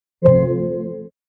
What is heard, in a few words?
Siri Sound Effects Single Tone; iphone original ringtones